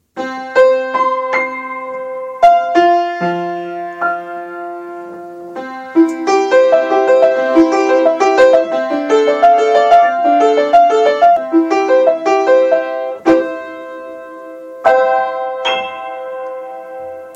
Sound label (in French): Piano tres faux